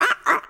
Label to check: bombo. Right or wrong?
Wrong